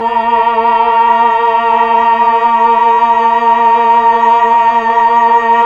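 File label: VOX Tron Choir A#5, Mellotron Vox, Slow Choir; Keyboards of The 60's and 70's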